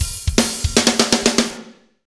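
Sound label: fast shuffle, Roland drum beats; Northstar - Drumscapes Roland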